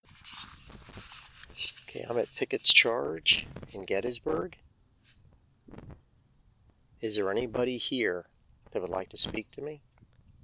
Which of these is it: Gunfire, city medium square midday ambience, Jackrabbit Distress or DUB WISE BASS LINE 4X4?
Gunfire